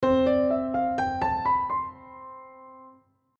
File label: Toy C scale